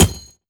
frag physics bounce gravel hard; gmod; viper

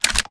reload loop fast load; gmod

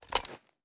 sm beta inspect; gmod

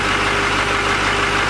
idle, vehicles; c5500

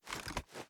reload fast start, reloads; gmod

